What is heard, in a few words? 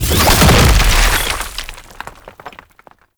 Egg Mine Burst; Warframe; Tau; Fallen From Heaven